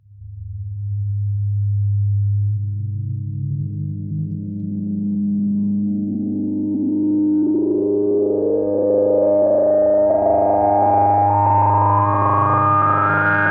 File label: keys steady climb, Phonky loops